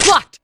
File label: block; p4u; mitsuru